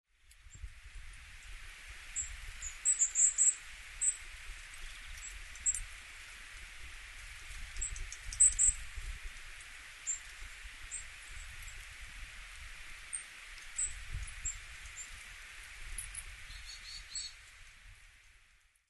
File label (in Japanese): yamagara, sado